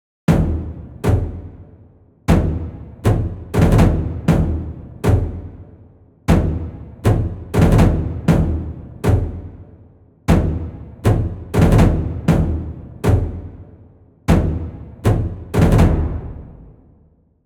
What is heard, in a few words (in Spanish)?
tambores; sonniss gdc